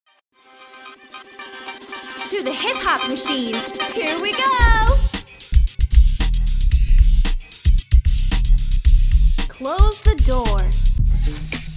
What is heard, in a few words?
Hip Hop machine